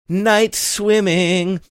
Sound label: singing